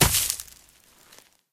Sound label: Character Bounce; Angry Birds